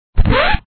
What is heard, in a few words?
Vinyl Record Stop; Johnny Rocketfingers 2